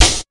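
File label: jungle kit snare drum; USB Soundscan vol.11; Drums Dance & Groove; AKAI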